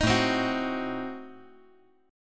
A Diminished 7th A down

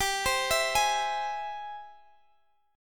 Ab Augmented Major 7th G, guitar

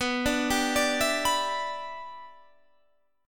E Minor 7th B

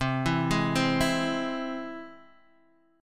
C Augmented down; arpeggiated; Standard; JGuitar

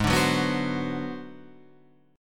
Ab 7th Sharp 9th Flat 5th down, chord; Ab7#9b5; strummed; chordshape; JGuitar

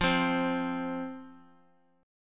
F 5th F, down, chord

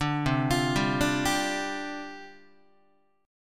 C Suspended 2nd Suspended 4th, down, Guitar

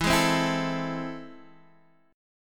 A Minor Major 7th, E

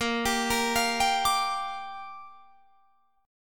A sharp 6th, Guitar Drop D

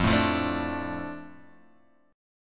F 6th F, chord; F6 Chord {1 0 3 2 3 X}; strummed

